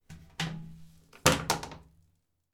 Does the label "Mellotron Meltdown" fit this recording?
No